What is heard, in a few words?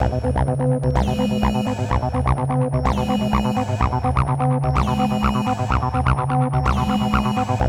ACID JAM